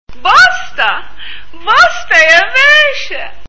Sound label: sms